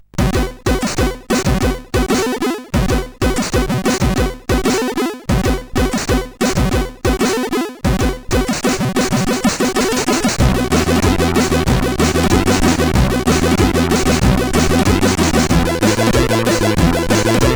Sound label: Swing